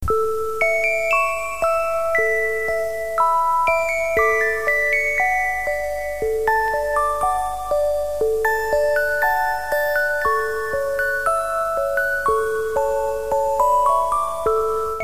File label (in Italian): Carillon